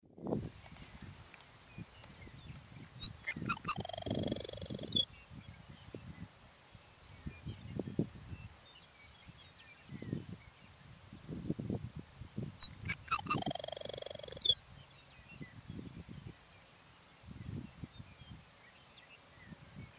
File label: Bairds Sparrow song, Soapstone Prairie CO